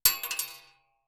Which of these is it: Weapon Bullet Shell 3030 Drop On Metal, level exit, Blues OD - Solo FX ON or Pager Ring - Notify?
Weapon Bullet Shell 3030 Drop On Metal